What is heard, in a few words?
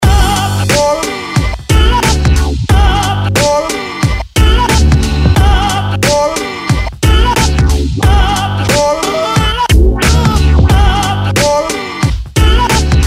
Lovers Loop; SafeMusicList